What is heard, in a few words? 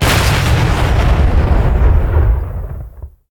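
bomb explosion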